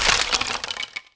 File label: skeleton body; Pirates Of The Caribbean